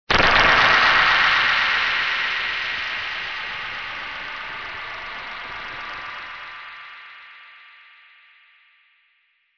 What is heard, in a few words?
Alien weapon sound; aliens